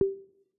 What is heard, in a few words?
Spatial Profile Scan First Tick; iOS 27